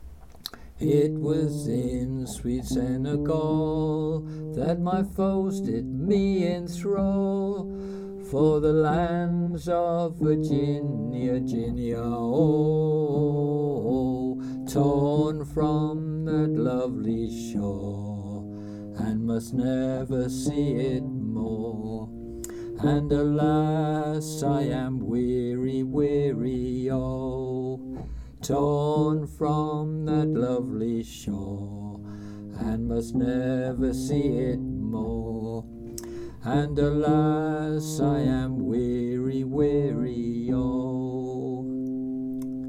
Slaves Lament Bass

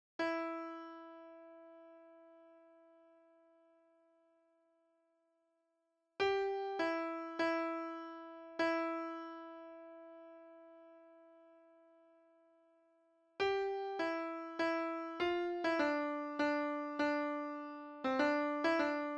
Alt Midi Pno

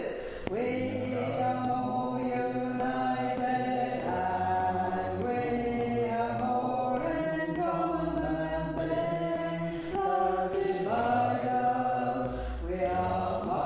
Bass

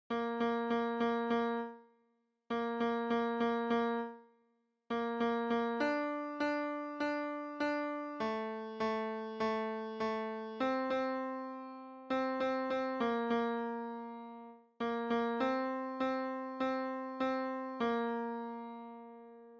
Midi tenor; bella ciao we are singing

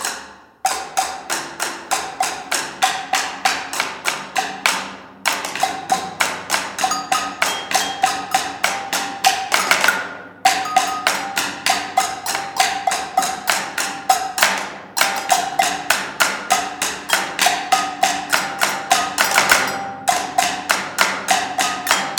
funk agogo rhythm Of Break

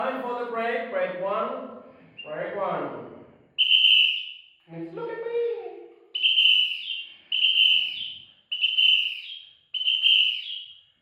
samba intro To Break

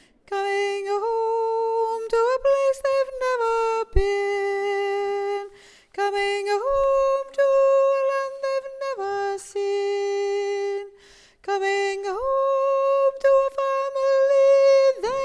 Top Chorus, singers

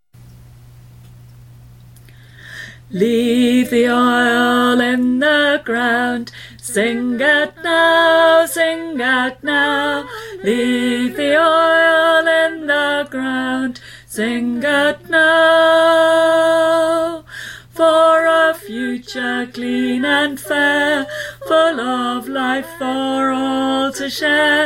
Bass

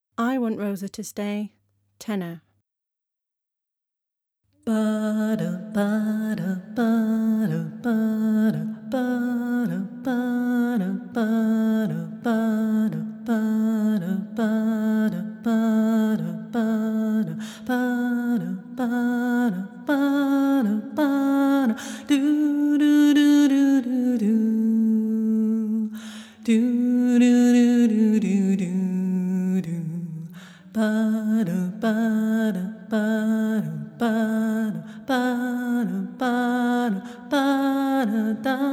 i want rosa to stay tenor

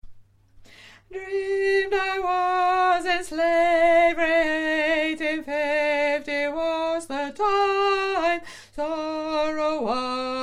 solo bass

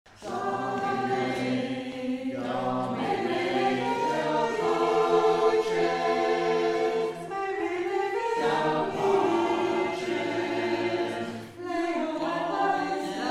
domine All

